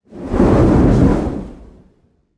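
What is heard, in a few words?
flame dies strong gust, torch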